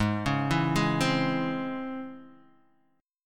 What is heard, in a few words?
C sharp Minor Major 7th, chord